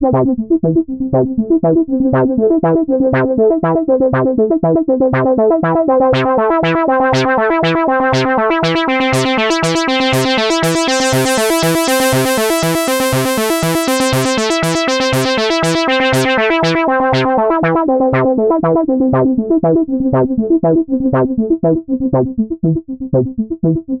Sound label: Moogerfooger Synth Mf-101s Filter Drive; Amazona Moogerfooger Workshop